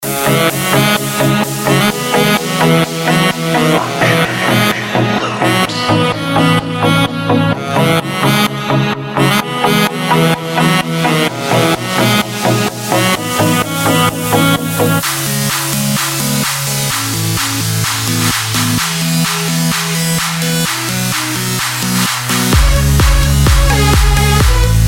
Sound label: EDM; FUNCTION LOOPS; EPIC SUMMER